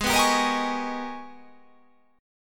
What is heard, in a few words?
G sharp Minor Major 11th; x,23,21,24,22,22,22,22